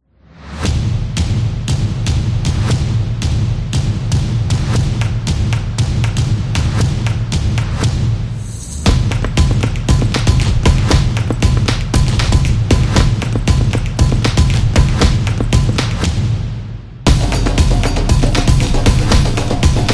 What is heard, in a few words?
Drumming Drums